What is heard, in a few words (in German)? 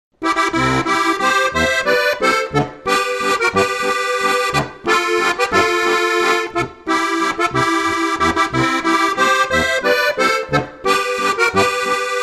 walzer